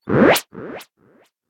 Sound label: shovel